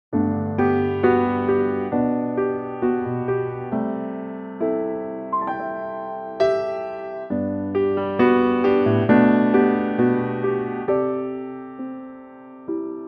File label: G piano